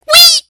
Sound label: Weeeeee!